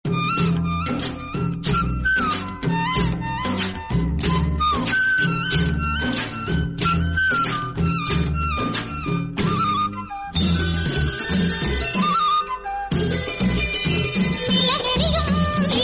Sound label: Flute